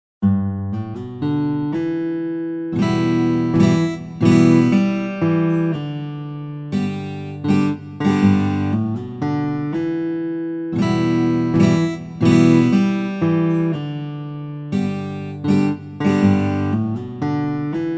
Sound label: Fingerpick 6string